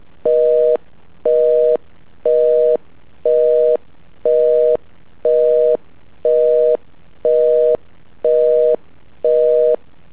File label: busy tone